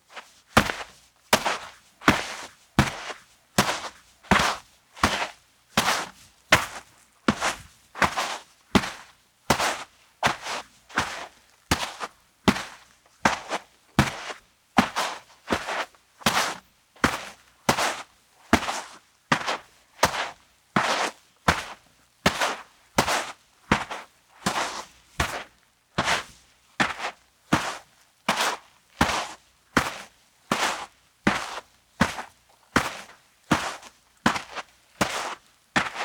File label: Walk Sand; Minora-Game; Sound-Artlist